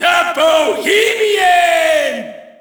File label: Shout, audience; UFC Tapout 2